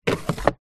household plastic utility unit drawer slide open

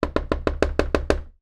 door knocks small glass window on wooden door; household

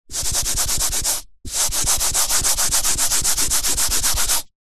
office eraser blackboard rubs fast

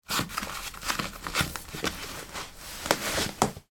foley boot work remove; sound effects one

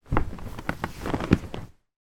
household bed person getting out; sound effects one

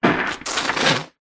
foam bubbles bath squeeze squelch hand; household; zapsplat

